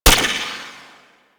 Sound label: Mech; Warframe; Void Trader; Gorgon